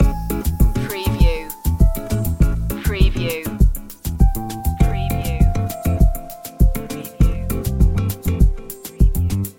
bossa technova loop

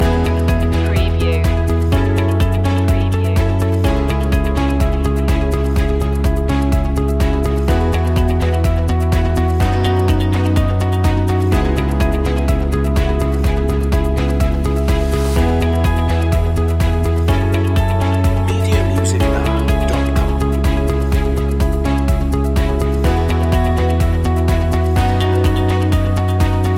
steady progress loop

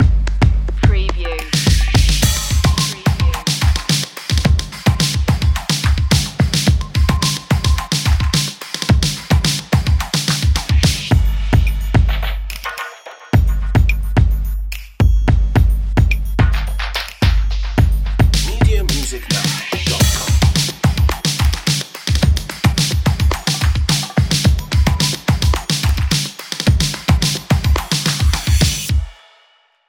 play that drums

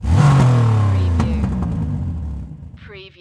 Power Car Single Rev

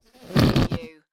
Whoopee Farting Blowing Raspberry Sound

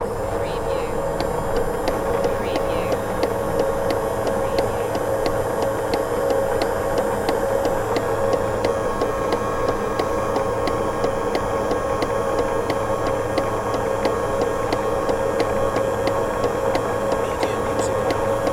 Fire Truck Lights Rotate

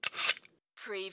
Knife sharpener, sharpen kitchen knife

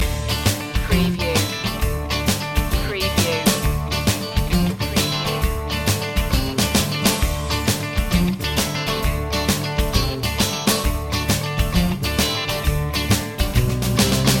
highway blues loop

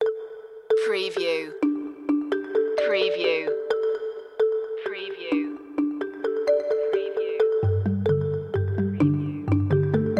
marimba mood; Ambient / Rhythmic Textures

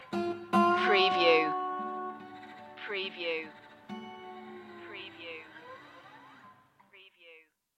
delta mercy stinger

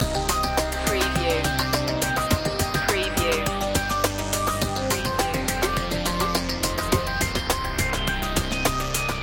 ambient breaks loop

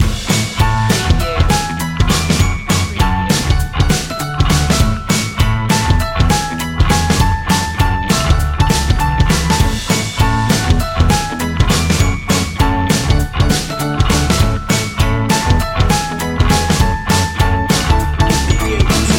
cheerful indie rock loop

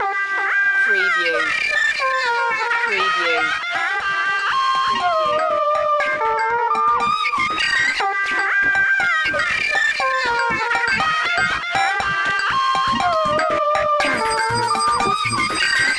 indian call centre loop

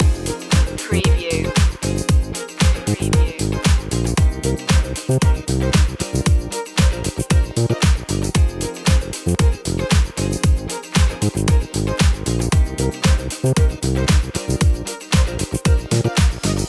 start up groovin loop

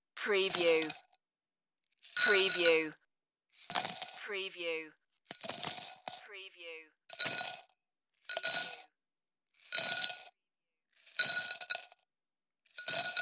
saving coins

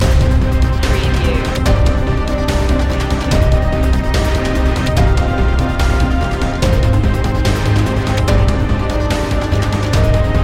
human machine loop

